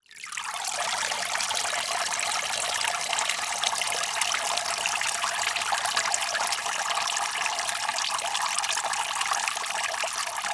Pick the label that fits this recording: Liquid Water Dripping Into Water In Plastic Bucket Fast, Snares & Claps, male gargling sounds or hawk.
Liquid Water Dripping Into Water In Plastic Bucket Fast